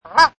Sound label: Duck honk, Duck quack; Classic 'honk' sound, also good as a comedy sound; mono; Ducks; Animals; LynneMusic